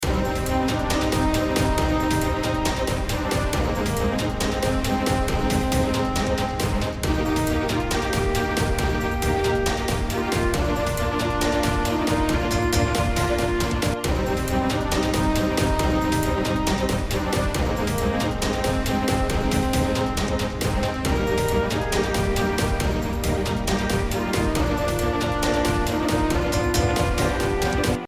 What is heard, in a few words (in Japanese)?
メロ Tp リズム